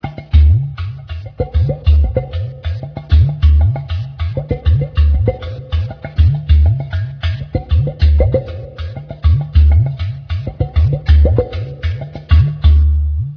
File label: udu